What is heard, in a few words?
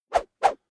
Whoosh Swish Sweep Long Bamboo Cane Two Swipes High Pitch Fast; Staff And Rod; Tom Hutchings